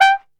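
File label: G3, flugelhorn, brass; Best Service; Brass Super Section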